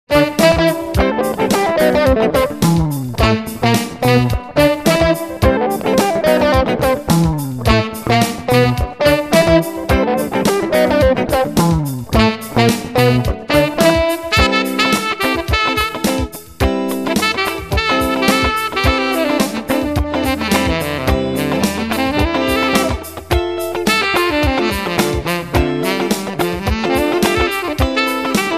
Lugdunum Funk

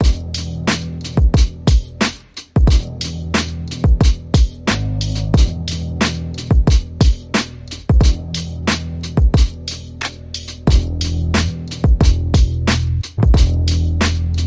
Drums Bass and Keys Only

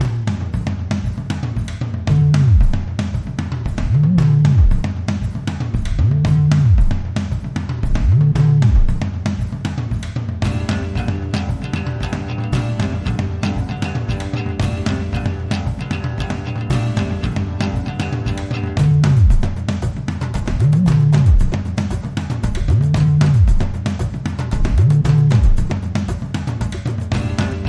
Drums & Guitar

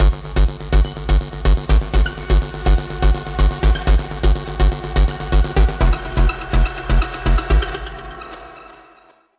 Link Sting

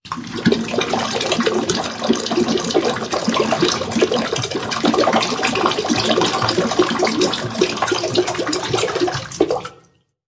Pouring Blood; Red Dawn

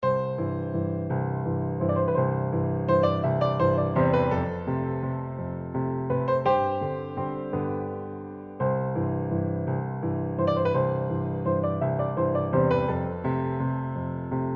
Delicate Piano Etude

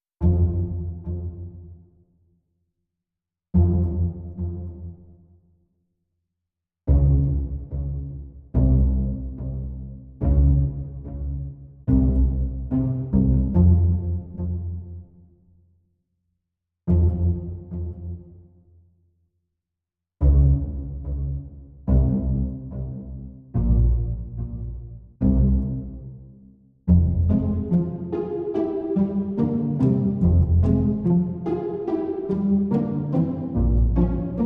Doodling Violins, Strings Only

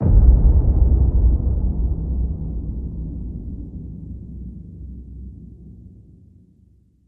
Hit Low; Insubordination

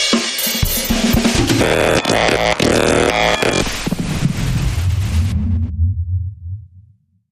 Hard Tumble Electronic Bumper Reversed

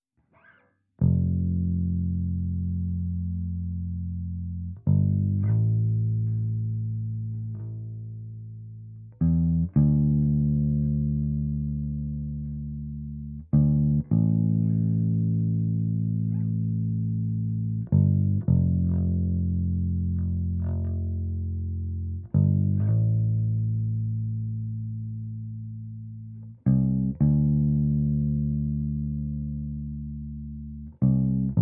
Bass

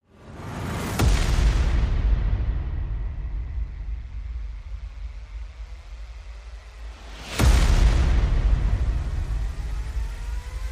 Percussion and Effects

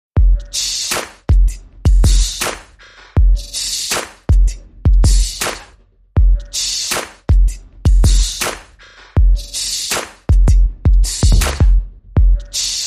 False Air Beat

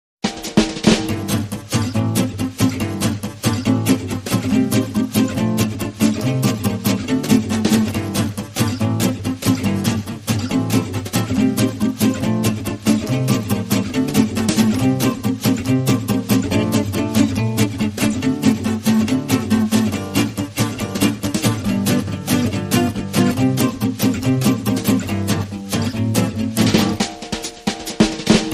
Drums and Guitars

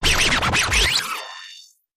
Hard Scrubs

